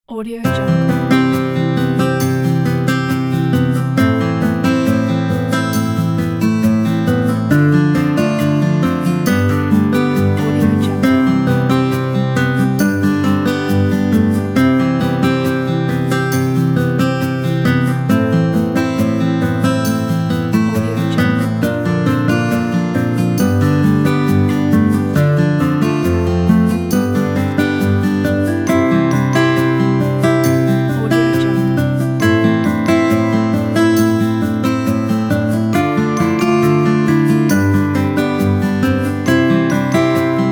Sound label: romantic folk guitars